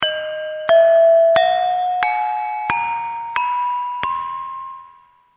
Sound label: saron pe, gamelan